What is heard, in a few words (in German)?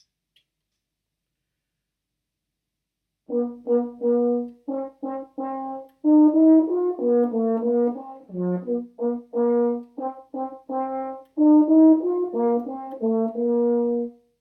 Horn